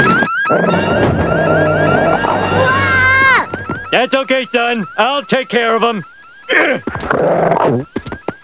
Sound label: Dog; Simpsons